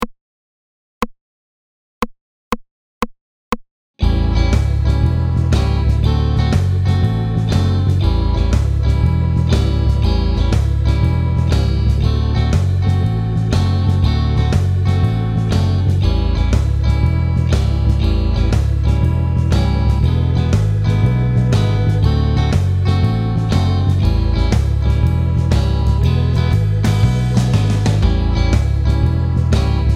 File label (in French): majeur 120